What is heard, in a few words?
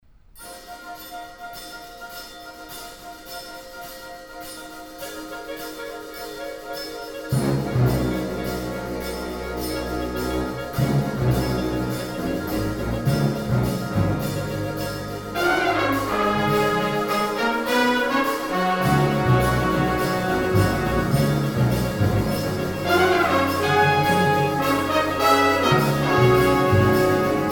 bandancing city shuffle